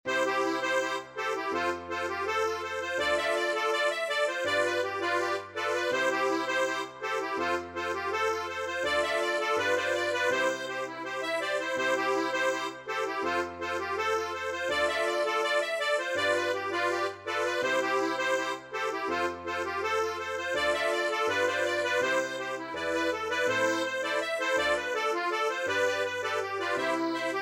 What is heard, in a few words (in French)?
scottisch mazurka